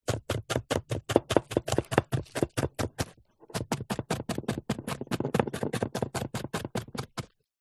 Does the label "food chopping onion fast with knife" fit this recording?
Yes